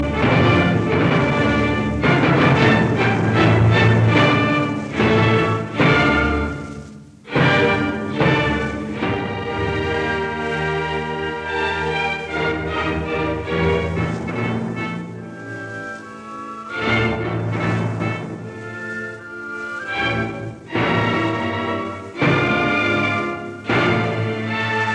Symphony in D minor Choral Allegro ma non troppo un poco maestoso; Op 125; Arturo Toscanini